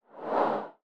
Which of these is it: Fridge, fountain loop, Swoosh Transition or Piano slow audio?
Swoosh Transition